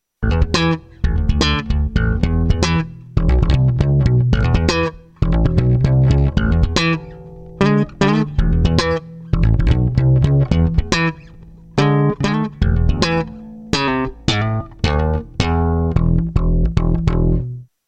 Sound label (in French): guitare basse steinberger; instruments